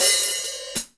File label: Hat, Hip Hop; Akai MPC500